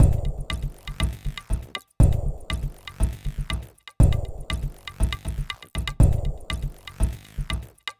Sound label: Candy Machine, Processed Percussion